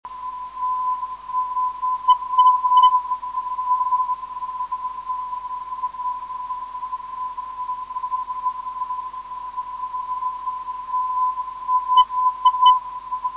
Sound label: 423khz